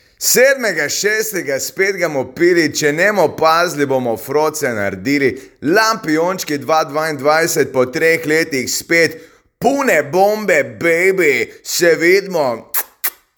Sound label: Jingle; STUK